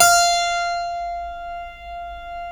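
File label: F4 clavinet left, Keyboards Acoustic; USB Soundscan vol.17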